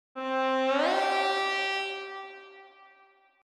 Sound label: Bend Test